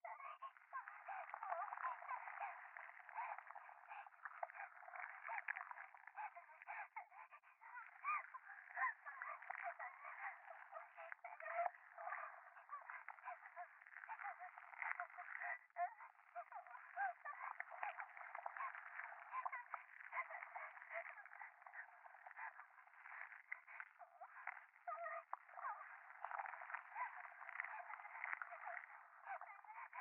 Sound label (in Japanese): 可愛い喘ぎ 触手